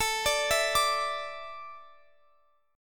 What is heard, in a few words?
A Suspended 4th A Guitar Open F; Asus4; arpeggiated